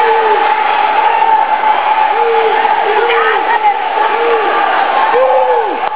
boxe, madagascar

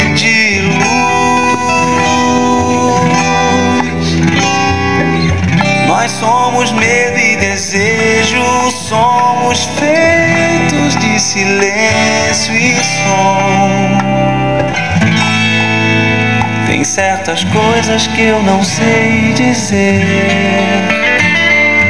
chanson bresilienne

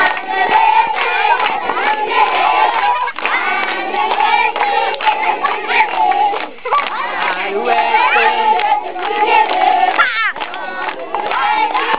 alouette, madagascar

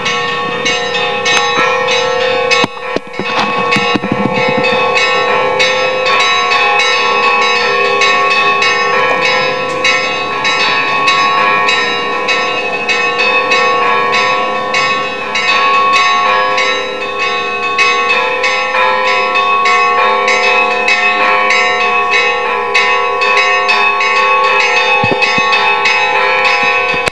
concert cloches

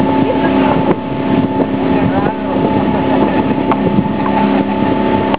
moteur, madagascar

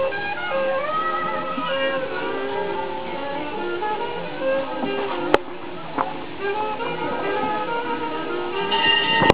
jazz, nouvelle zelande

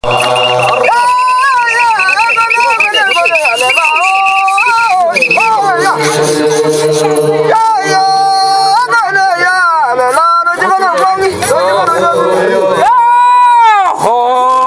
chants hamers, ethiopie

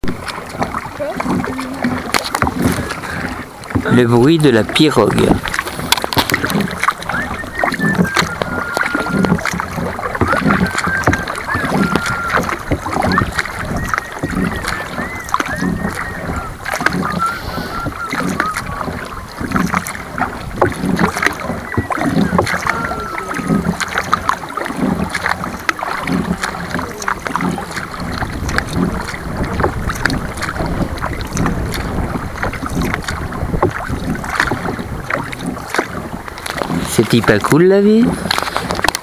pirogue, madagascar

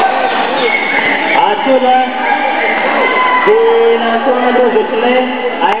boxe, madagascar

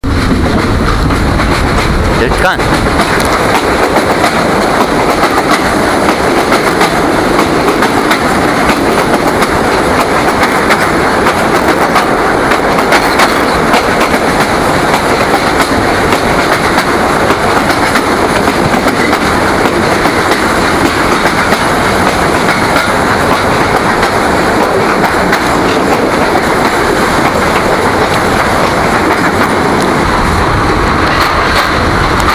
train, madagascar

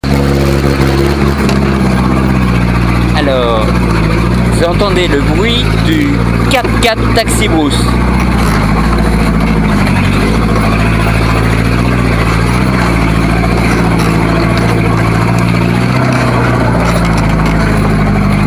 taxi brousse, madagascar

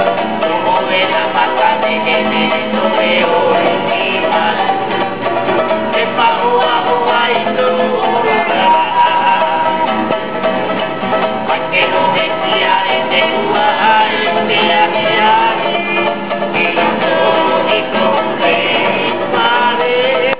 chanson, tahiti

